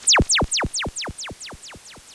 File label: zap; JGame; matrixminer